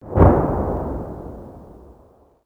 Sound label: distant explosion